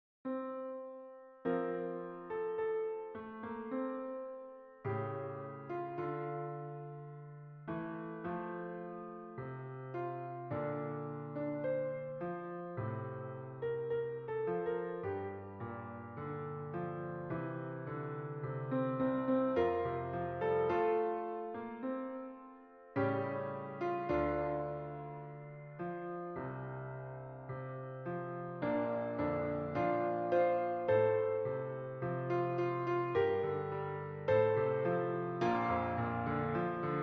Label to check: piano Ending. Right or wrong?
Right